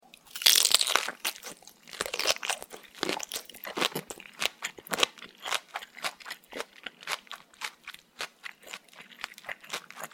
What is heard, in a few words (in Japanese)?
ピーマンとひき肉の咀嚼音 口閉じてる